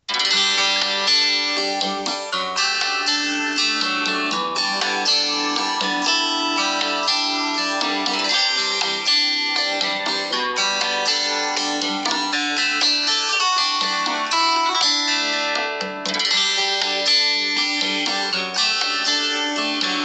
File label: ringtone; huawei blue harbour